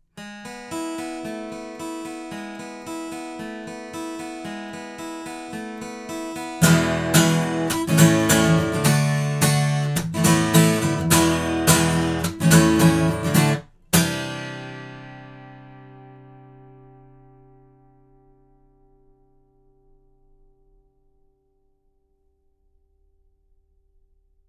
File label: Sontronics Orpheus omni guitar